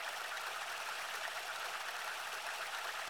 reel spin; Big Paul

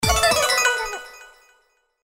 Win With Wild; Thanksgiving Blast

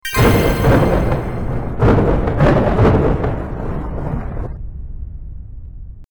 Big Win; slots; Fortune of Olympus

